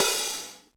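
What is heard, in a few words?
open hi-hat Acoustic Crush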